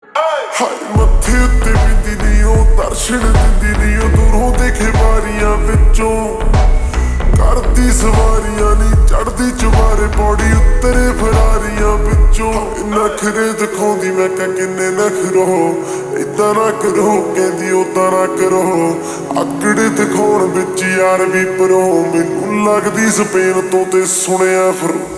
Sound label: slowed Reverb